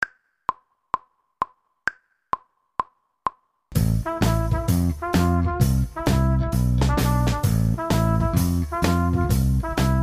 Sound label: trumpet section